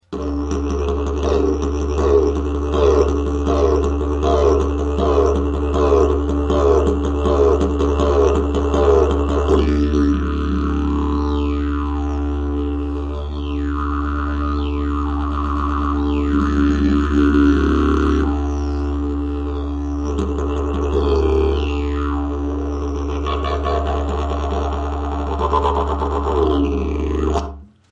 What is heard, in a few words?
didgeridoo